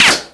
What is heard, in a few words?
bullet ricochet metal